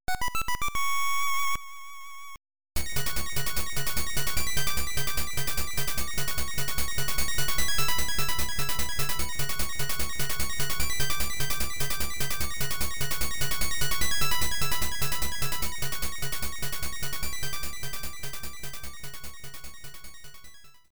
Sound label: Get Power-Up; Legend of Zelda The Link's Awakening 1993 gb